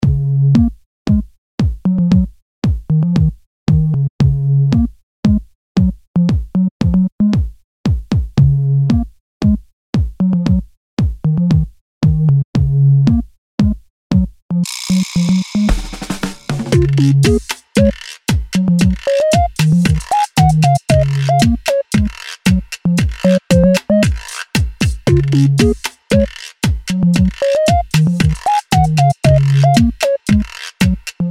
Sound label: Guiro Song